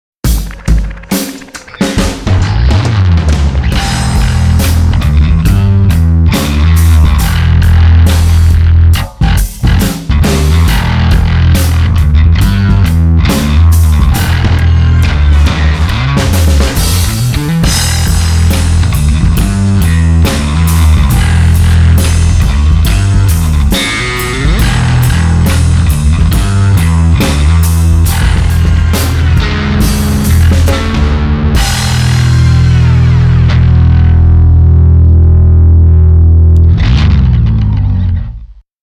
me-20b patch; ME-20B Bank 1, No. 3; Fat overdrive sound.

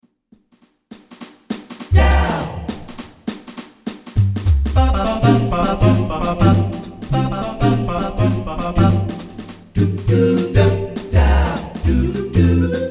jazz scat

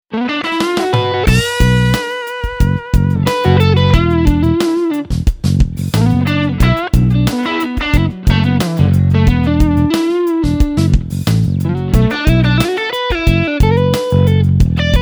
patch demo

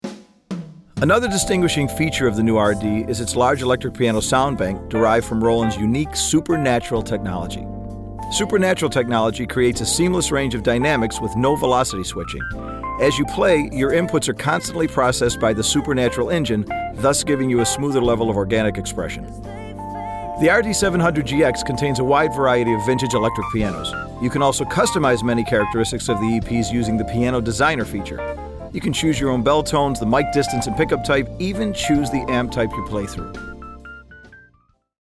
super natural; RD-700GX

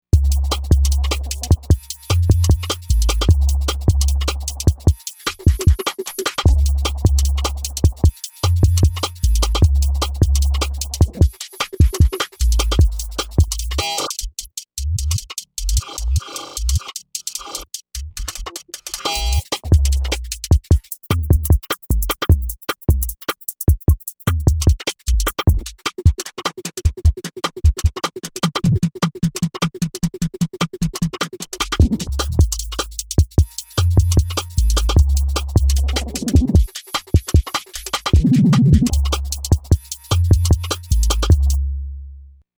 v synth xt d and b vibe kit

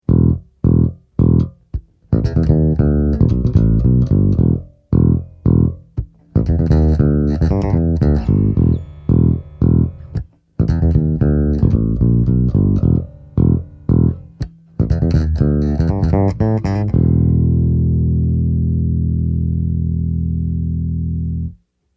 cb 30 session

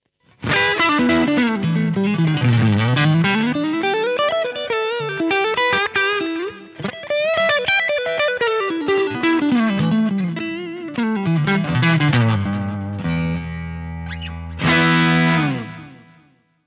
touch go

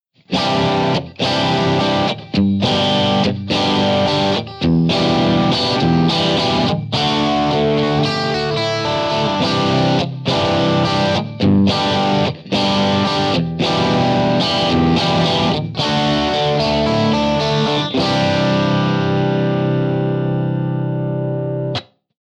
multi mic amb

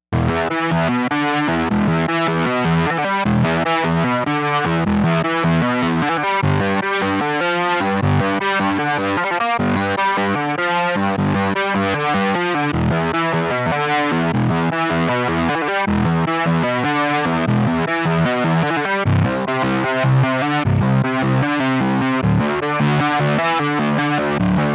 a lil driven; Jupiter-80

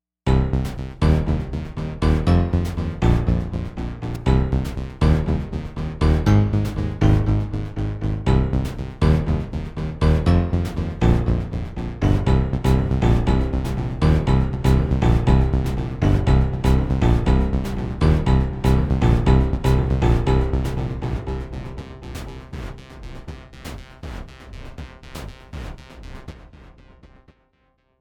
bassic pulse; Jupiter-80

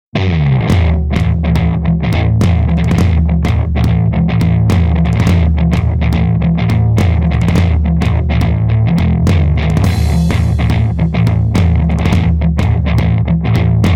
bass; od 20 tone demo

